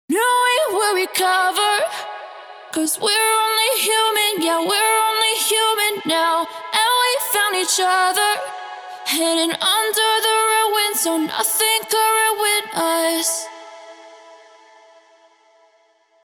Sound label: Vocal reverb delay